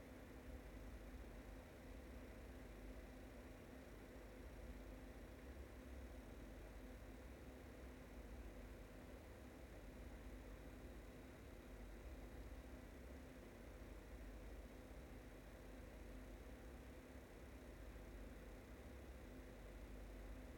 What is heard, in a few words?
noise